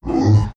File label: grunt; alienkeeper